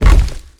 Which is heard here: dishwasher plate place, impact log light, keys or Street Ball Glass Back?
impact log light